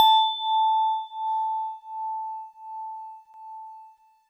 GVibes DX100 A5; good vibes

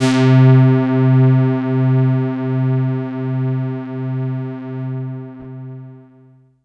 BASS high; Magno

